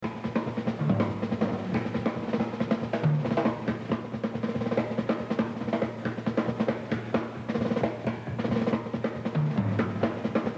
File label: Jazz Drumming